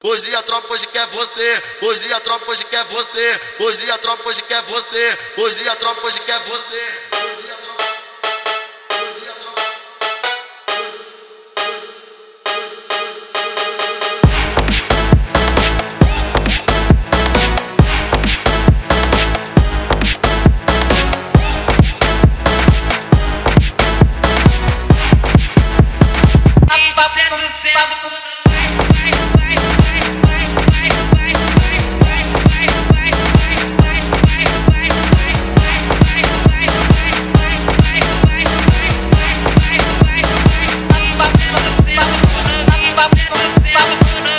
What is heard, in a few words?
brazilian drift